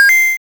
8 Bit Coin

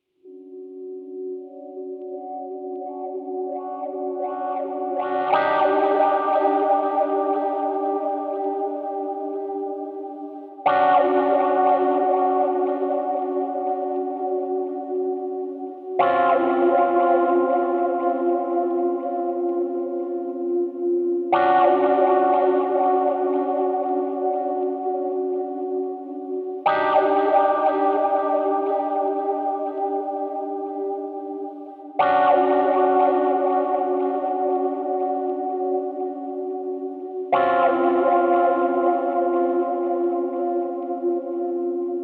Rhodes Wah

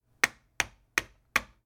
Tapping Atm Card

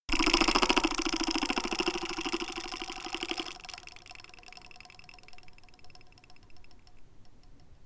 Broken 1950 S English Door Stop Spring

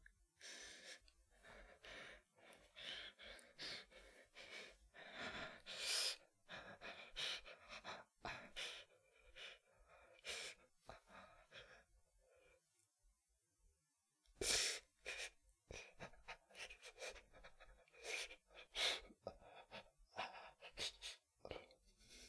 Breathing With Pain

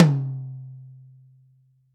Tom

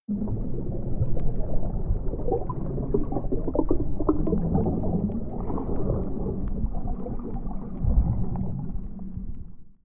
Under Water Bubbling

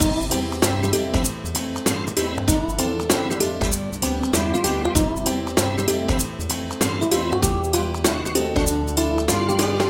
Childish Theme Loop

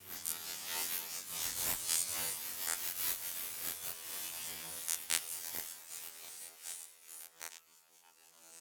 Electric Lighting Skill Spell

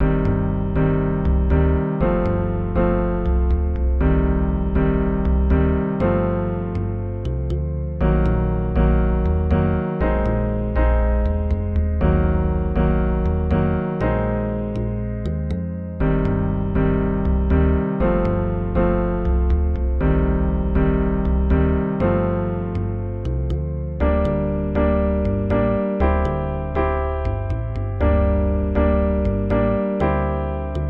Hold Music

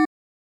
Beep C

